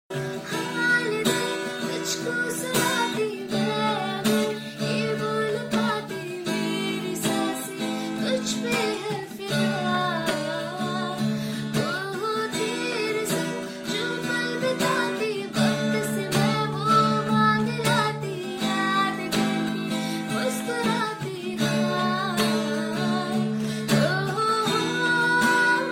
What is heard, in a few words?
female